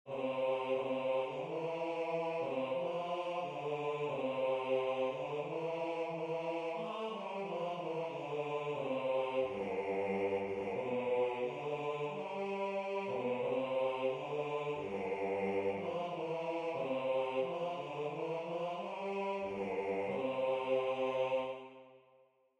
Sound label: Bass